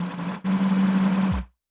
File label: TECHNO FX 140